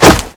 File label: stone hatchet wood